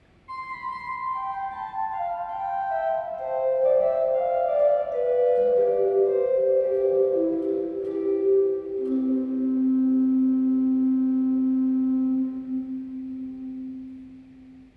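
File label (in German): nachthorn 2, Pedal, Nachthorn 2'; kirchenmusik; pfeifen; gereon koeln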